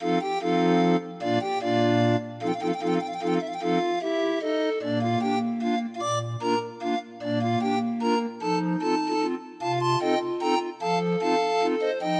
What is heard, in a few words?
Die Ballettanzerin Mazurka 31 note, Grind Organ